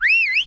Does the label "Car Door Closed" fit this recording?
No